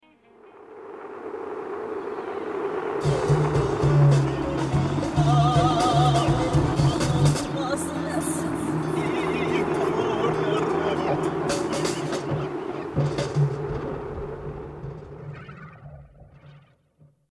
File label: Intro Viola